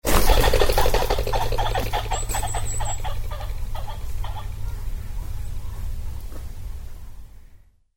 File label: startled pheasant